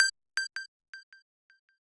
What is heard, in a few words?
lead